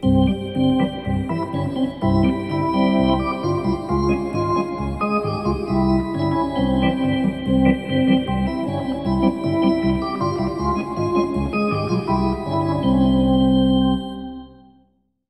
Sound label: Hockey Organ